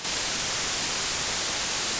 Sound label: allpass